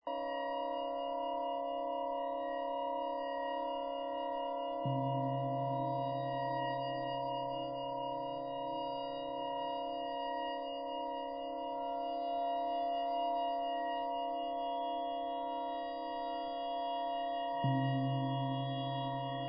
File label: Overtone Ensemble